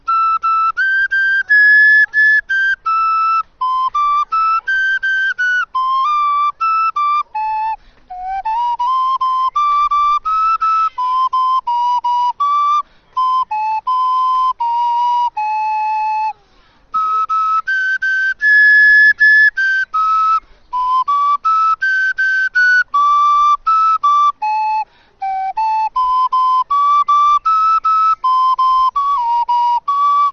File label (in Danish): vikingemusik og sang; vikingemarked